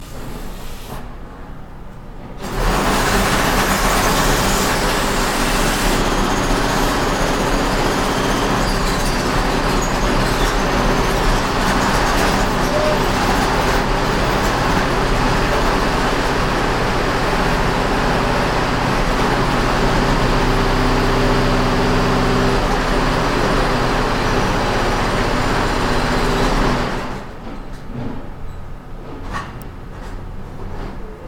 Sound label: construction